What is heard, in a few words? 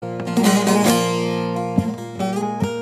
Baglama saz